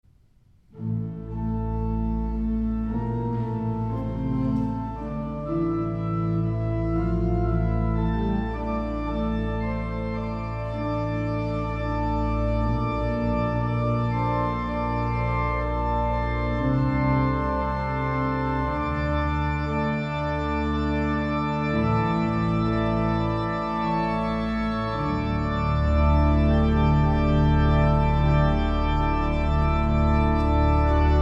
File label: Organ; St. John's Cathedral, Jacksonville Florida